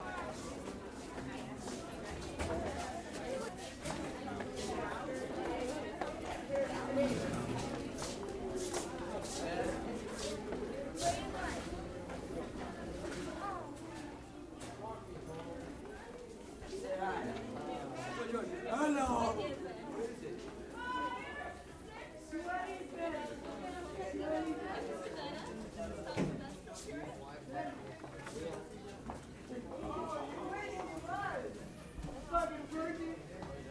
School Hallway Stude, student walla

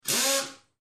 Air Drill Straining; air tool

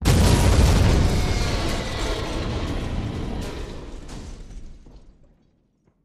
Explosion Metal Deb, metal & glass

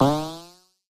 synth boing; boings

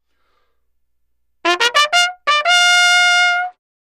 Bugle Plays The Unkn; bugle civil war

